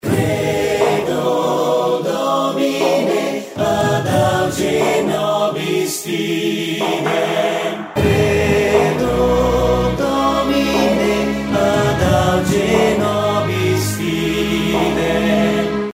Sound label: ringtone